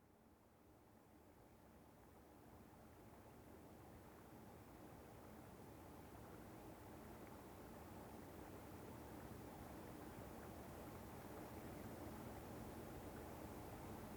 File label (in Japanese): 綿棒+オイル耳かき（左耳）」（クシュクシュ綿棒+ナチュラルベビーオイルでの左耳耳かき）, ASMR; かかあの綿棒+オイル耳かき（左耳）